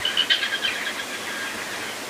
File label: Birds Various